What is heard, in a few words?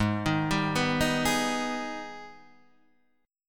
Ab Minor Major 7th, Guitar Drop D chord; AbmM7; arpeggiated